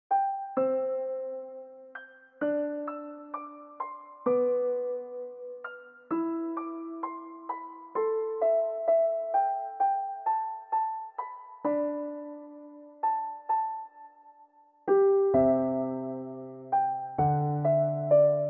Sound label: Piano Cover